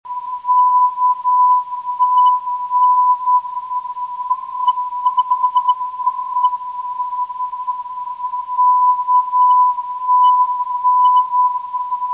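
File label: 372 5 kHz, non-directional beacon; cyp lbn syr ndb; ktn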